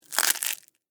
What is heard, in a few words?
Crunch Sugar Cone, Soft, Waffle; Texture; BlastWave